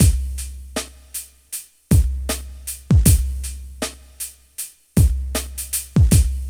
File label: GRV, Guit It On; E-MU Formula 4000 Series Vol. 1; Hip Hop Nation; Guit It On 78.5